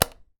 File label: Light Switch On